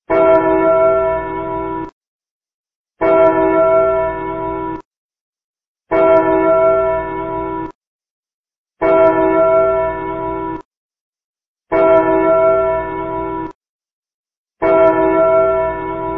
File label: Clock Tower